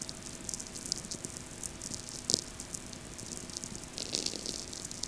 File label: VLF; StMichel